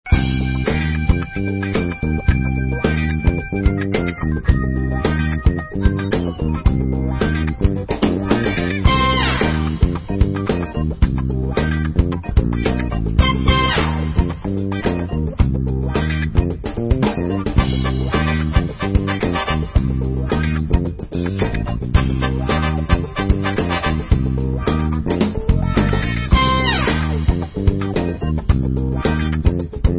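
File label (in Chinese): 挑战场景背景音乐（慢节奏）; xingqiu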